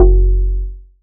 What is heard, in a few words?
Bass F#, techno synth shot; Bass One-Shots; Ghosthack; 45 Free Techno Synth Shots 2025